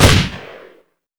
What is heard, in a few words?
ar33 fire, weapons; gesource